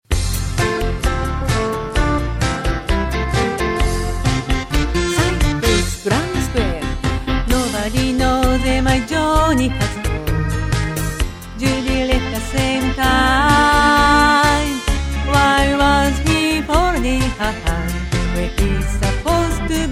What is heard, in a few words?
vocal